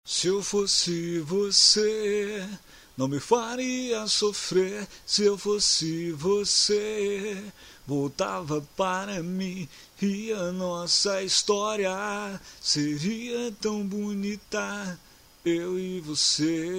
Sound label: funk